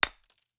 construction stone

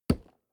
lumber tree

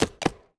tree, resource

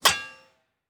mine metal

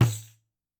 alarm invalid building placement; interface

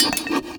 Mill; 0AD; building Completion

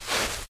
fs sand, singlesteps

